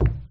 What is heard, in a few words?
ball cushion, pool